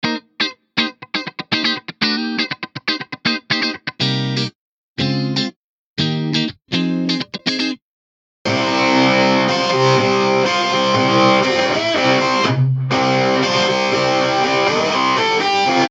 Reamp Original Git

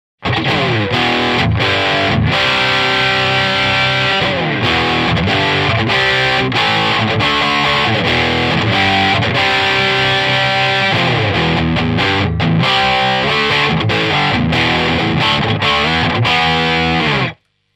JCA 20 Vintage Rock

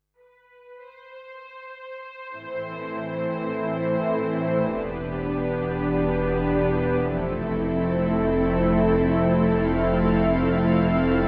Strings

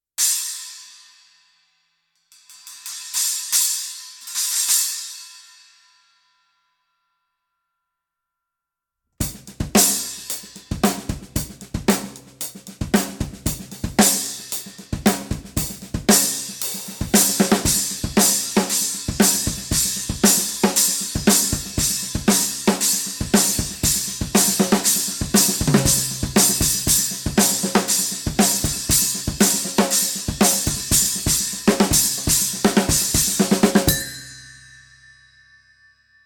Piccolo Trash China

